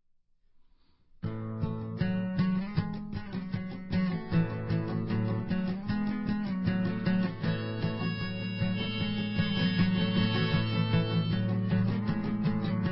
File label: Acoustic